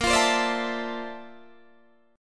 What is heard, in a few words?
Bb Major Sharp 11th Bb down Guitar DADGAD, chord